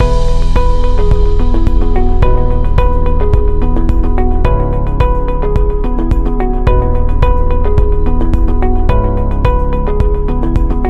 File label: A Corporate Loop Inspiring